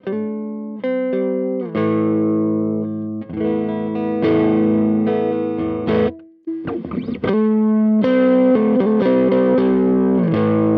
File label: Compadre Fender Squeeze Kompressor